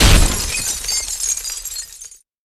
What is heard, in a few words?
break glass